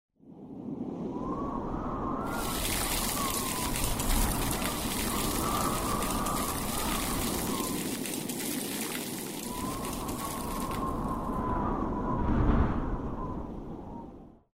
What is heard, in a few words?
wind ice